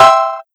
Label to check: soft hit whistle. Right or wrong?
Right